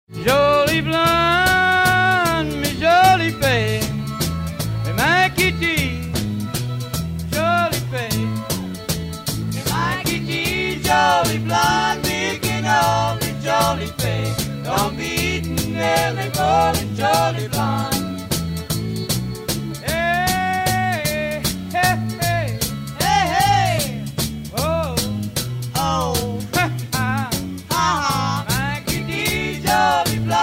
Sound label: country nu notes jolie blon